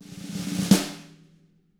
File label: ROLL, snare rolls; ILIO; Double Platinum Drums 1